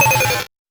Noise A, 8-bit, Bitty Noises

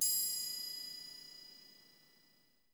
TRI PP, Percussion; Miroslav Vitous - Percussion & Harp

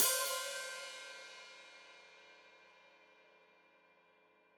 Hat Foot Open, Hi-Hat; Cymbals Stereo; Deeply Sampled Drums

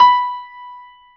PIANO B4; Proteus1

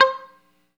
GTR Mute; 90's Sample CDs; Club-50 - Foundations Roland; Funk & Mute; Chunk Mute

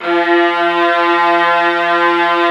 Vla F2; Digital Sound Factory Virtuoso

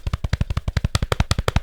Running, cartoon Foley Fx; musicradar; cartoon caper

